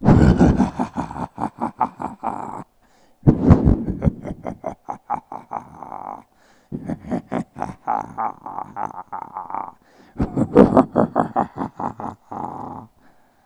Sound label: beast laughs